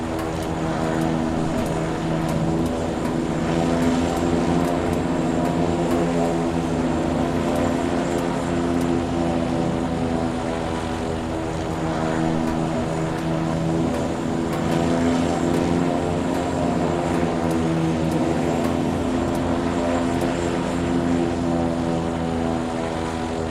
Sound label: prop plane, helicopter and planes